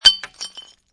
Glass Bottle Drop Small Break, Impact, Glass Beer Bottle Drop On Cement, Partial Break